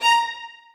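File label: 2nd violins staccato a#3; Sonatina Symphonic Orchestra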